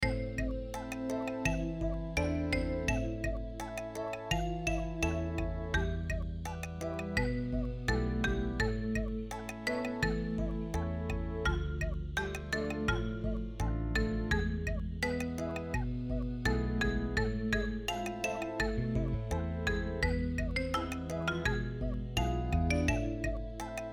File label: cuica slow tempo 84